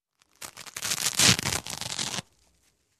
Big plaster ripped off

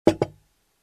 Plate put on eating table

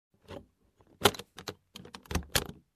Suitcase Close

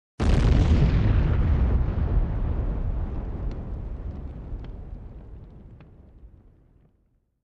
Bomb explosion up-close

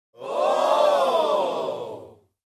Crowd amazed reaction

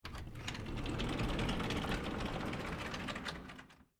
Folding door opening

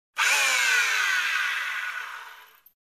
Construction

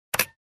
Rattling key press

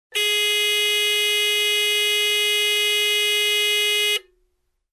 Car Horn

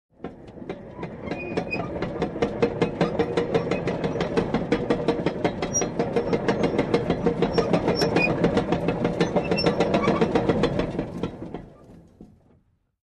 Manual Crusher Machine

